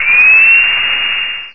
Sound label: turret spin up; Source Engine; bshift